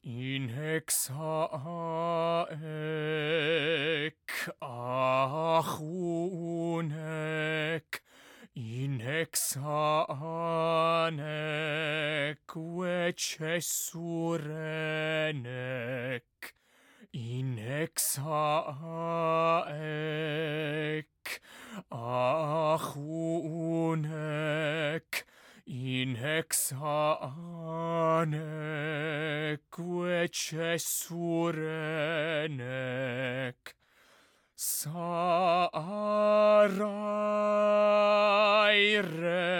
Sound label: Religious Song; Assassin's Creed Origins Gamerip (PS4, Windows, Xbox One) (gamerip) (2017)